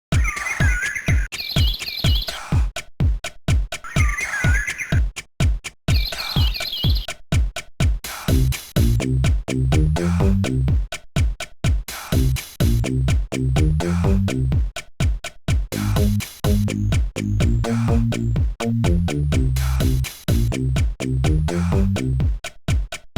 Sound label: jingle; Lotus 2; Classic Game Soundtracks